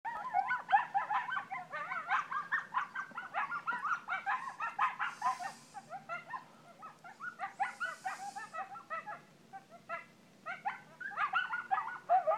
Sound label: coyotes singing